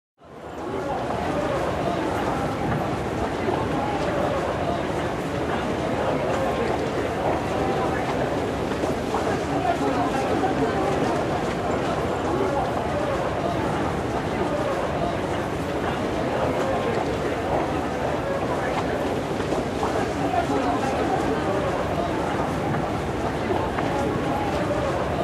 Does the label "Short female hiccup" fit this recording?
No